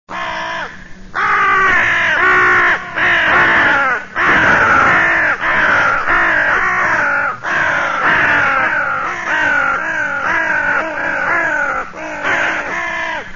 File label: CORVO CROW CORBEAU KRAHE; Canti degli uccelli